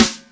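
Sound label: acoustic snare, Classic Hip Hop; STYLE KITS; Classic Hip Hop Mega Kit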